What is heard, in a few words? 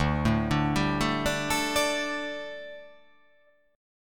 D Suspended 4th D, down